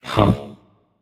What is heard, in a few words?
notice; smite; achilles